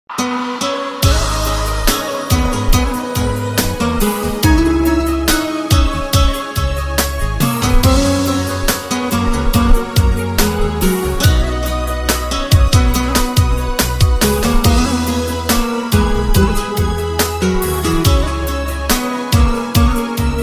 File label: Romanian Guitar